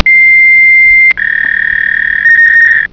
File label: fax, office